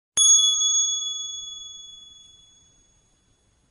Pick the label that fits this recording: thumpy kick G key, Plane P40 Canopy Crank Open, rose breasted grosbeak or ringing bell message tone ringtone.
ringing bell message tone ringtone